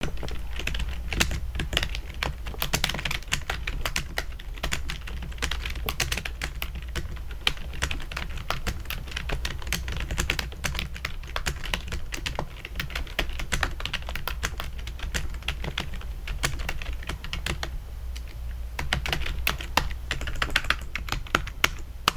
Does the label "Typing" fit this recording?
Yes